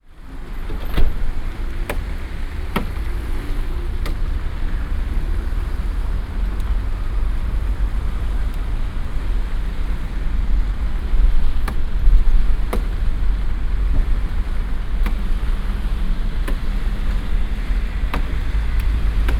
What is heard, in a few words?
board fixing; sergiyev posad